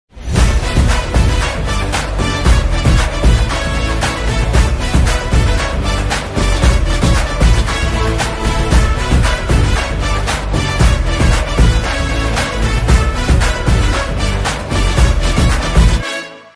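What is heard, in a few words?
song background music ringtone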